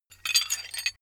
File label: metal handling pieces